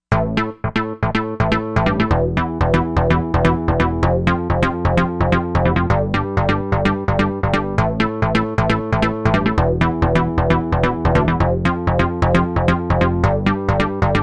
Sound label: Dancin Bass, Korg MSC-07S Synth